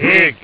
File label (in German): Indianer; ST-XX Amiga Soundtracker Sample Packs